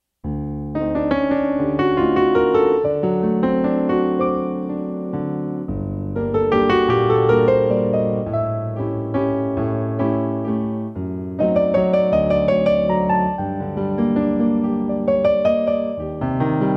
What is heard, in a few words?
Waltz